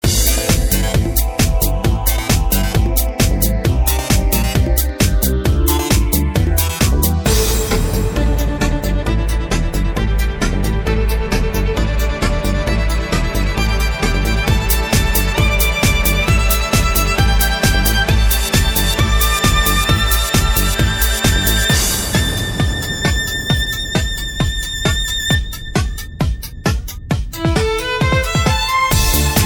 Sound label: Interactive Violins